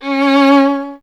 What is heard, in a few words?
STR VLN, Vln Detache; Miroslav Vitous